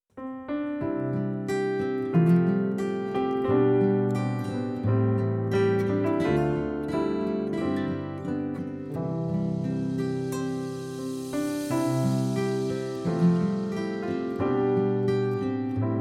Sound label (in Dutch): Heer ontferm U instrumentaal; kyriale